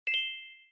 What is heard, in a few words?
new quest